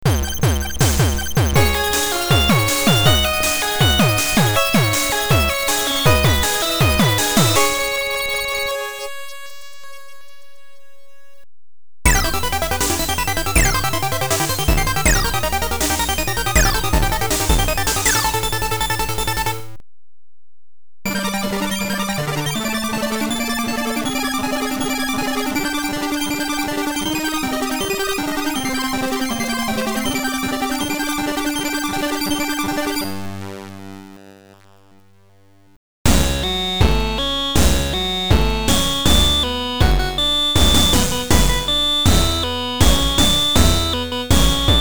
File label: More Eight Bit Stabs